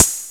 hihat, drums

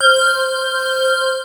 Space

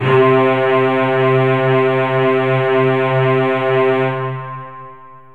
b strings

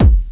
kick, drums

